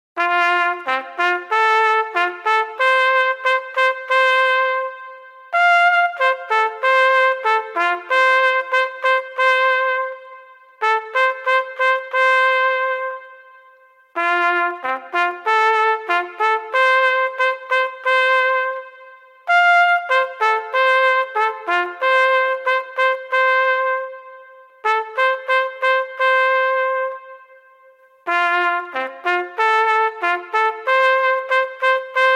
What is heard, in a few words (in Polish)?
hejnal